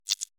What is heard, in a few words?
close Menu